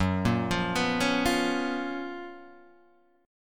F sharp Major 11th F sharp, Guitar Standard; arpeggiated; JGuitar

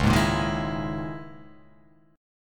C sharp Minor 6th Add 9th C sharp

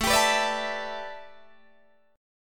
A 9th Suspended 4th A, Guitar DADGAD

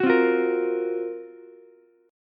F sharp Major 7th Flat 5th, Ukulele; strummed; JGuitar

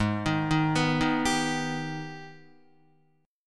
Ab Minor Major 7th, down, Guitar; arpeggiated; AbmM7

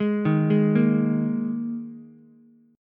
Bb 7th Suspended 4th Ukulele, chord; arpeggiated; JGuitar

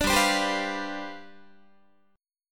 G 11th, Guitar; strummed; 17,15,15,17,15,16; 4A,4E,5A,5D,5Gsharp,6Csharp

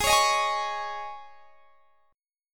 Ab Suspended 4th; strummed; Absus4; x,23,23,20,21,21

